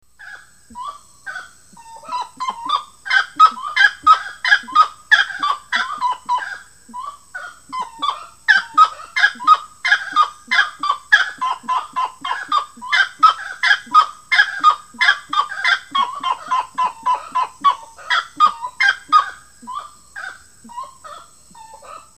Gray-necked Wood Rail

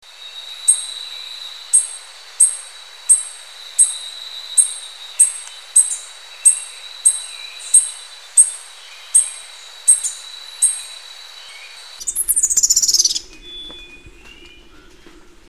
Common Bush Tanager

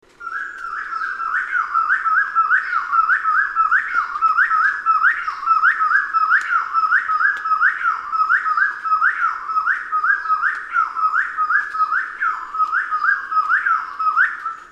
Spotted Wood-Quail

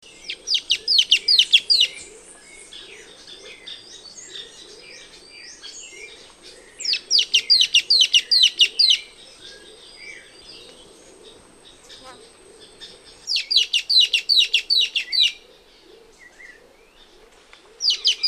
Social Flycatcher

